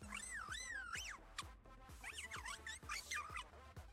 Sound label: music chirp; Hammond; overwatch